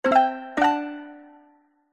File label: multimedia game sound plucked accoustic and mallet positive tone